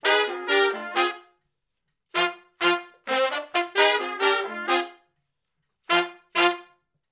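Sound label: R&B Riff (F) harmony to unison, Horncraft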